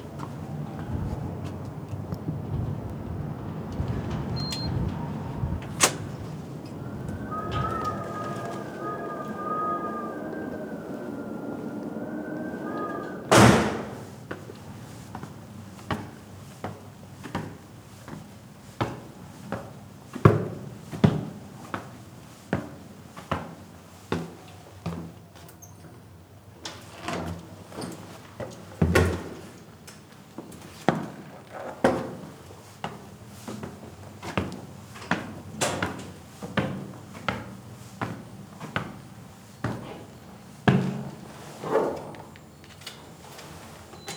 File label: paranal tour platform; UT1; soundscape